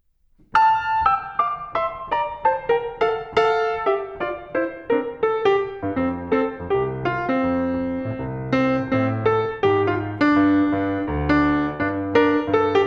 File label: Jingle Bells